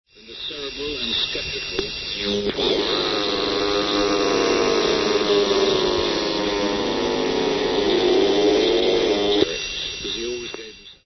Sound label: 198kHz